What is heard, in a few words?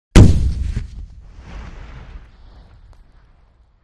Claymore Antipersonnel Mine; One Sharp Low Bl; One Sharp Low Blast With Echo Roll Off And Debris Fall At Tail. Close